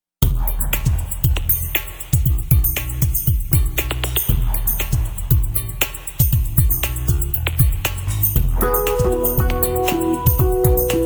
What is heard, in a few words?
Western Mantra